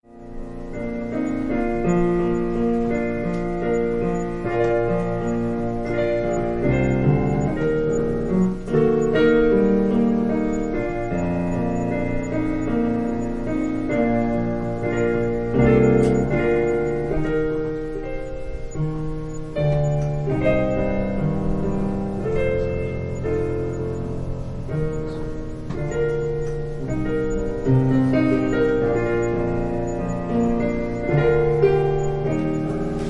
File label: Piano prelude; A new name